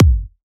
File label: house kick a sharp key, kick sample tuned to the A# note. Loudest frequency: 589Hz; kick drum samples